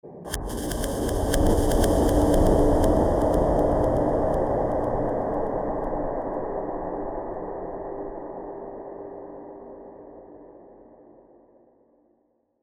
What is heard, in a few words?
Eerie Rhythmic